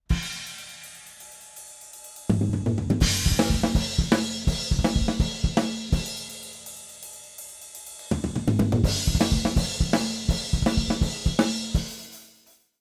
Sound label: Drums time adjusted; time adjusting a multi-microphone performance